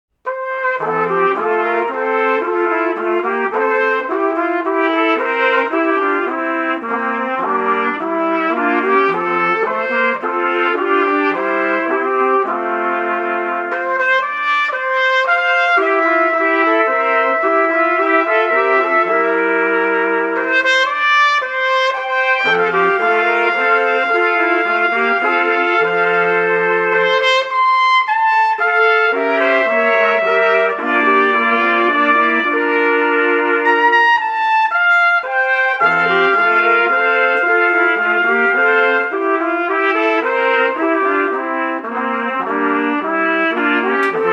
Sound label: Chorale on Happy Birthday